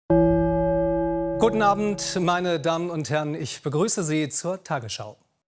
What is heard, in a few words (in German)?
Tagesschau Gong; Constantin Schreiber